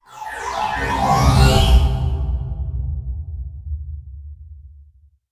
Uplifter

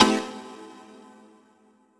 Synth Cuts; Vengeance Samples; VES1 Instruments

